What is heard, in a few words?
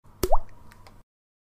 Water Droplet